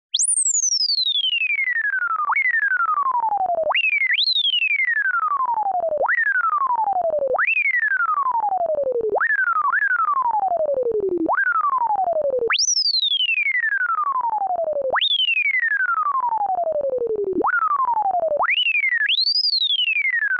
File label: flight sound